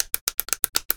Button Clicks

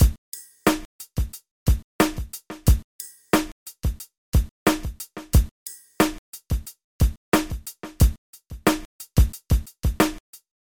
90 bpm drums only

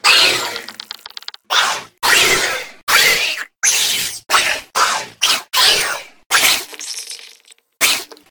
Spider Monster Screech

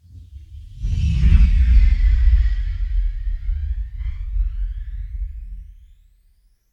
Flanging Rumble Flyby

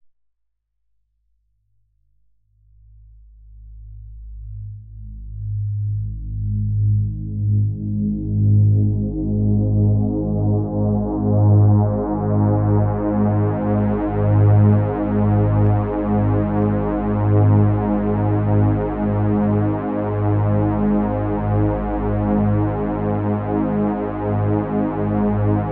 Reese riser

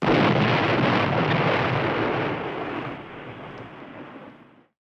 Cannon Shot

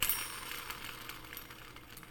Kitchen

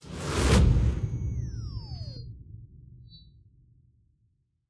Lazer Swoosh Signal Impact Intro Logo; Chakong Audio

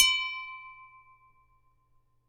Glass

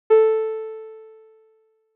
Synth Beep

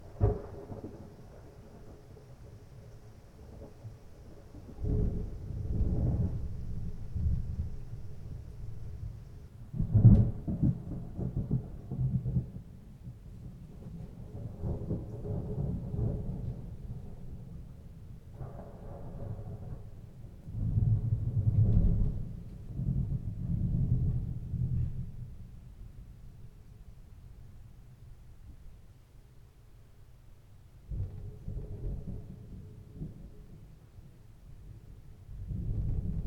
Rain

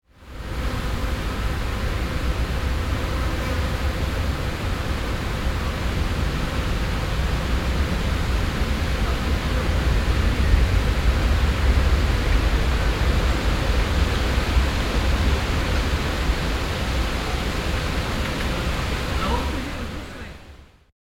Park Insects

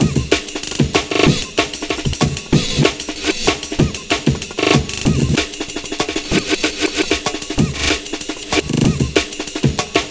8 Bar Breakcore Beat 190 Amen Break

